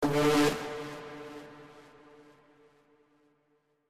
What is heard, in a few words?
Hi Brass wet